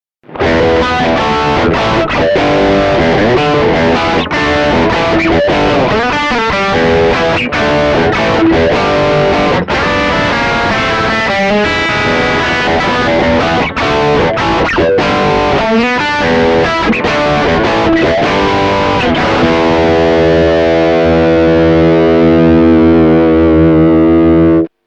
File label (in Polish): Przester, classic strat humbucker